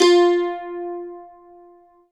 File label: mandolin F; leads